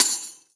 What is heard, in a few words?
Shaker; Vengeance Samples; VEH1 Percussion